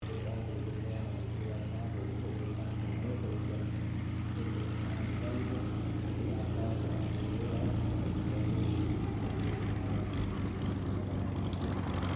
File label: AIRCRAFT P-40 KITTYHAWK TAXI; P-40 Kittyhawk / Warhawk / Tomahawk; Airplanes; Vehicles